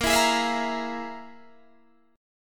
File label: F Minor Major 7th Double Flat 5th